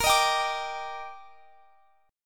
D sharp Major 7th A sharp

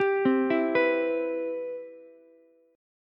C Major 7th, C, down, Ukulele; CM7 arpeggiated; JGuitar

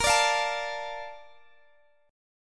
Bb Major 7th, Bb, down, Guitar, Standard; BbM7 strummed; JGuitar

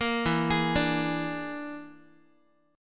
E 7th Flat 5th, down, Guitar; E7b5; arpeggiated; 13,12,11,12,x,x

